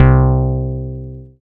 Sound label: BAS MKS-50, Roland